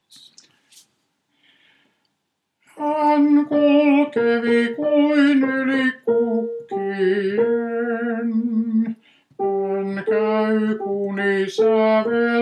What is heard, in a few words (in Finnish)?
tenori, miehet; 1. tenori; Vetelin poikakuoro